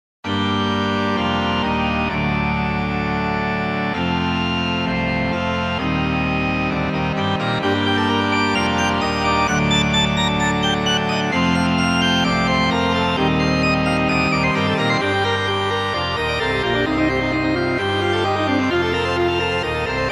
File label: Bagpipe